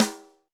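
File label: Snr Cntr Rim, snare; Alesis D4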